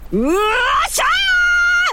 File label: yooooooshaaaaaaaaah